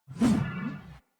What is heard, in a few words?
Great Sword Pre Whoosh; Weapons; Swords; Dendrit Ara Great Sword; Accents